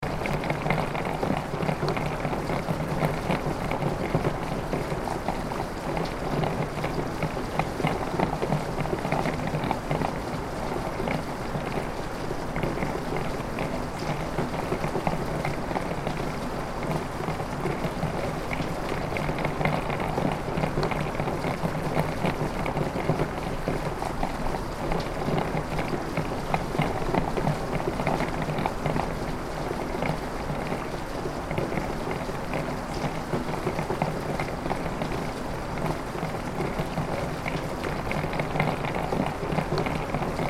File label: Rain on window interior perspective